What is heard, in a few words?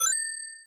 coin spawn sfx; Season Land